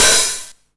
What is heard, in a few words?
909 OHH Tape Sat; Goldbaby Tape808 & Tape909; The Tape 909